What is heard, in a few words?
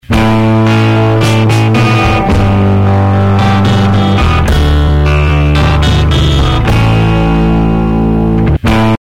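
With Effect, overdrive VST plugin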